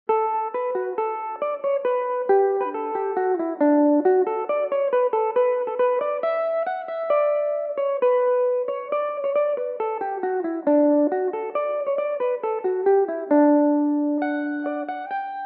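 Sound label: D major, Irish mandolin; sunset clouds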